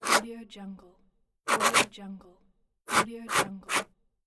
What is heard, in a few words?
pen line